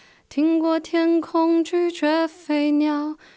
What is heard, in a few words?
Alto